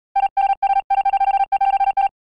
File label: Eject sound effect; Among us